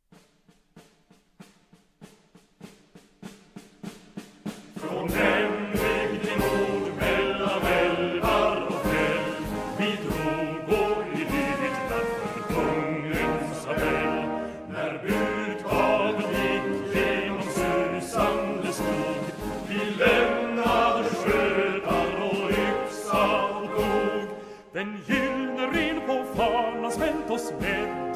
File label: Finska Rytteriets Marsch, Anthem; Sunland